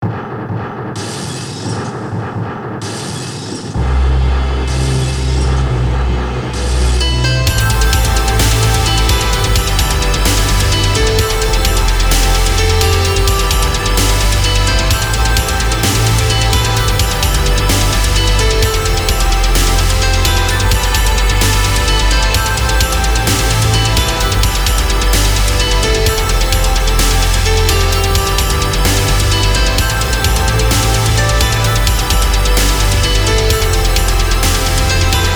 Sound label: Non-Vocal ver.; Figurehead